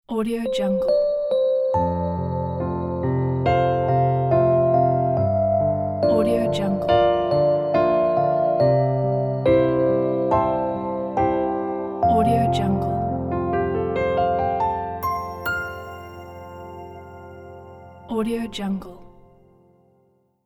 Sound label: pleasant logo